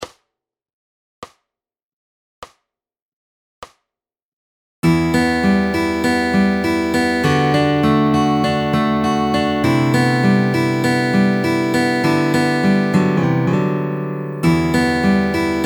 Fingerpicking Fun Solo Jam

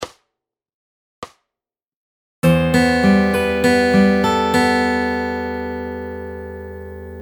Fingerpicking Lick; Fingerpicking Fun

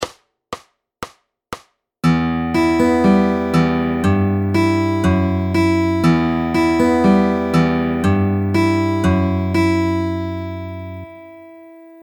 Fingerstyle; Getting Started With Fingerstyle

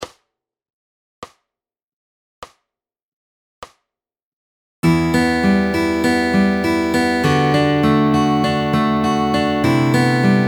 Fingerpicking Both Parts

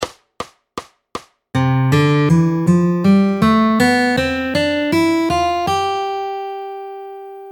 Fingerstyle 3 Chord Folk

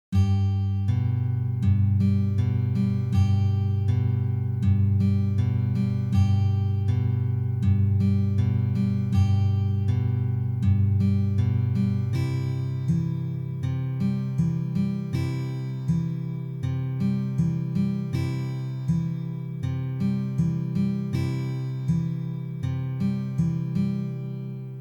slow

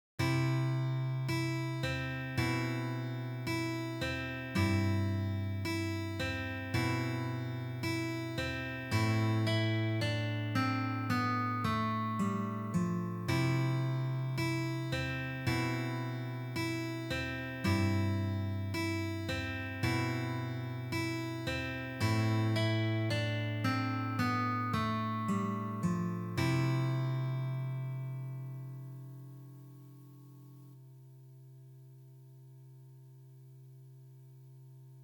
Fingerpicking

